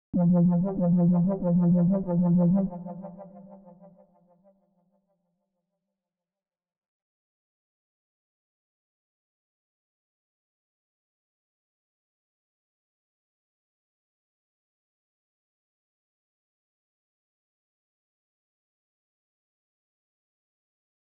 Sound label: Sirens